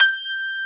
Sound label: f#6-g#6, piano, grand; Giga Samples Collection; ARS Grand